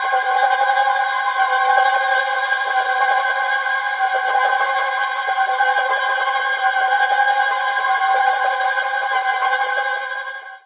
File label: Fluttering CG, Light; Zero-G Ambiosis